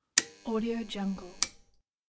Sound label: fuse box while turning on the light